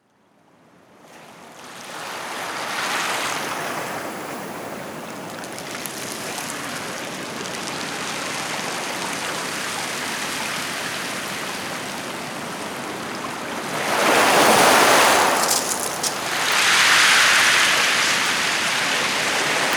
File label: ocean beach in oregon